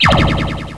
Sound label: mage spell; Exile III v1.0